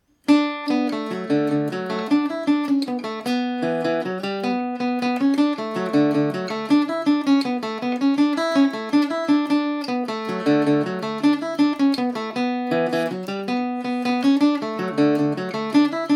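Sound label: shandon bells d major revisited, mandolin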